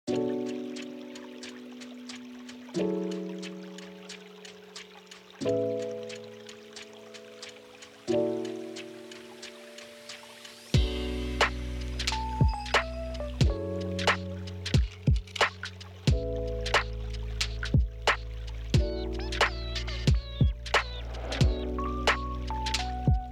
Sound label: Wistful Whispers, Lo-fi